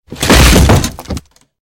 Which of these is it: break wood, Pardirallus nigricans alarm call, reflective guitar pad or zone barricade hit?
break wood